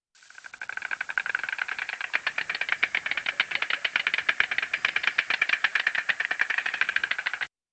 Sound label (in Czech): Cap bily